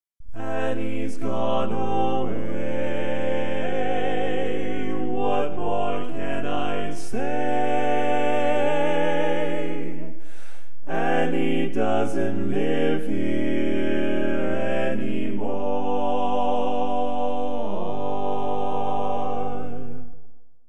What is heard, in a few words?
Lead